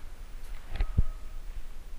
unexplainable noise

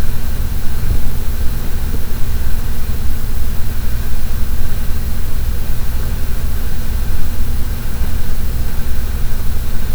more footsteps

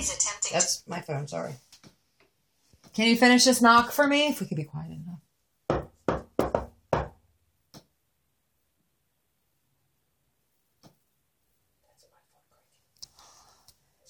shave and a haircut

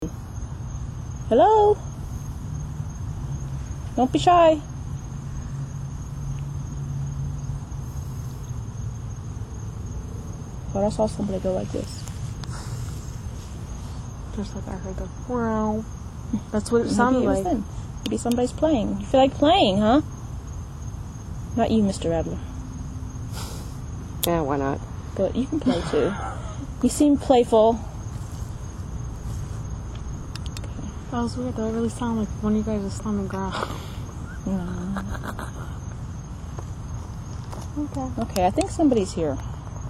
Cemetery Laughing; Star Of David Cemetery

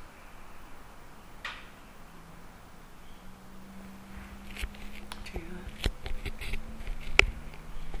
bang

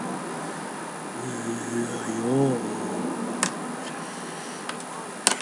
CHANT

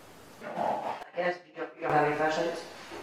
growl

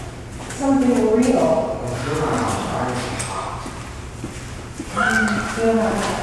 weird laugh; Get out out

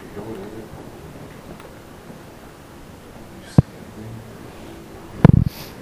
footsteps

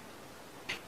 tap or fish tank

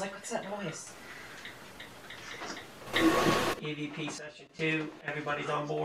sounded like laugh now like something

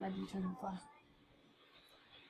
flashlight

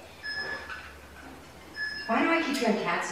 cats meow

